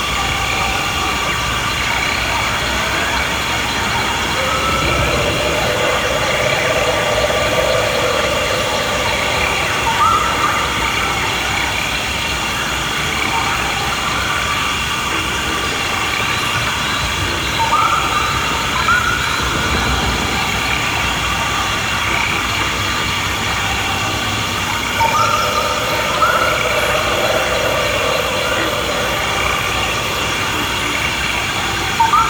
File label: Swamp Amb Mix Loop